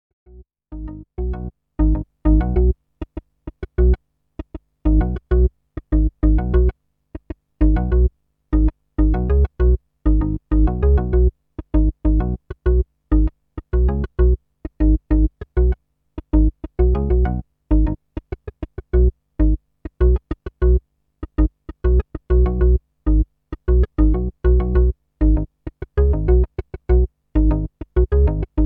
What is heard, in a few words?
bit99 dry env